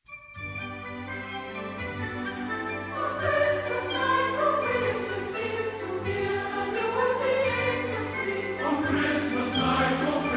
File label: Bach Choir, Sussex Carol; 圣诞节Christmas